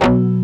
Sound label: DIST DAMP B0, DIST GUIT